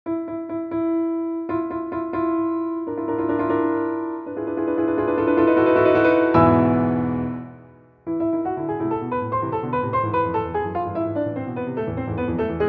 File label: etude piano; classic; liszt